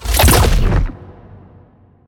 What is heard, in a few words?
activate; Smite; god Kuzenbo v2